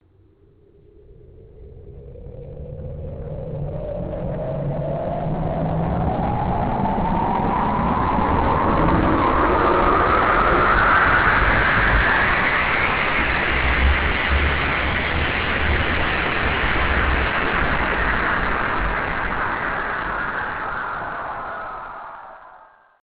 Thunderscreech

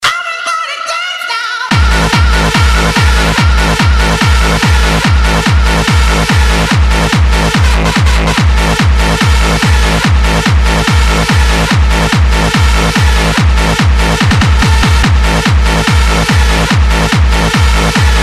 Hardstyle

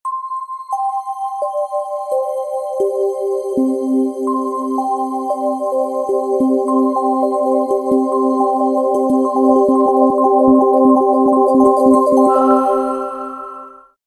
Sad Ringer

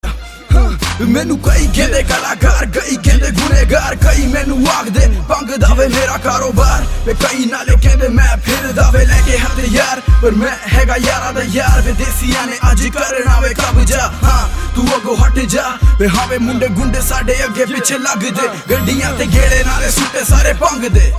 Punjabi Rap

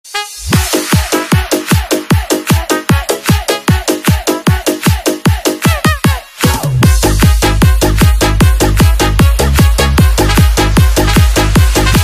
Nightcore; Russia

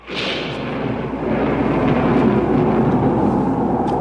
Thunder

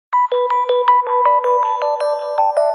Sms bells

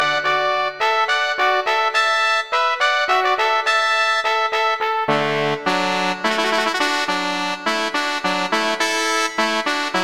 Water Music

